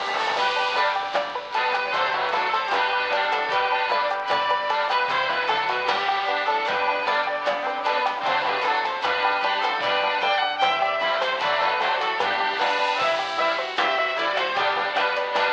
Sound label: Stinky's Radio (Sea Shanty); Sam & Max The Devil's Playhouse